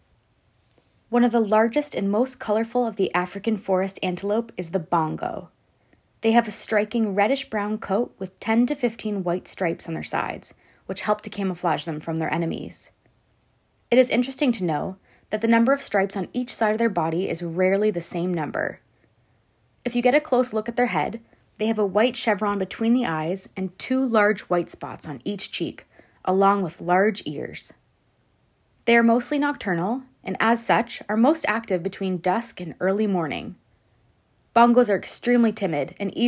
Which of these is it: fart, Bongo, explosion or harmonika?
Bongo